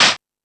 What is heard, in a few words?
Dance 2000; E-MU Pro-Cussion